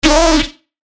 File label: bass shot C#; Amoeba; revealed bass shots vol 4